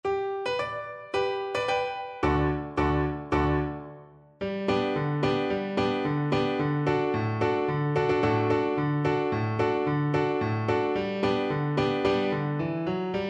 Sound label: tiroler holzhackerbuam, Viola